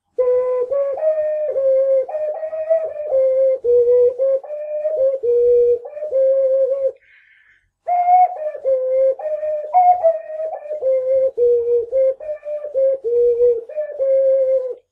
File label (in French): plastique 12 trous alto, ocarina